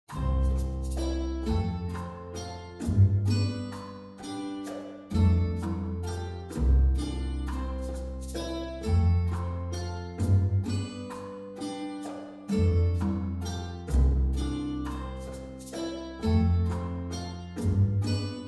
Clean Chamber Wet 100; Metric Halo v4; Cuba NKI; Tai Chi

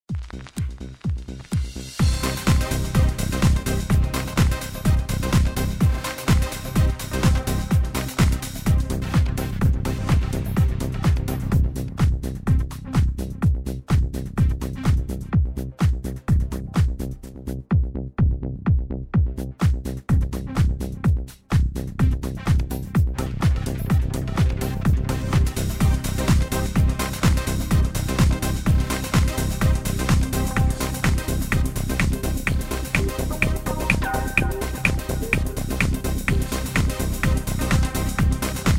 Korg Pa5x KAOSS

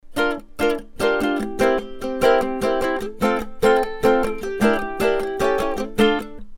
Monkey Banana Mangabey Ukulele

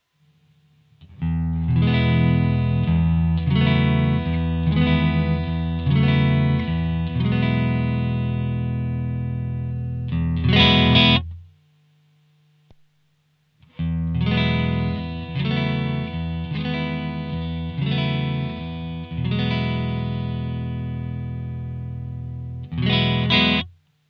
Amp AB AC Combo all on 12; Simlpifier

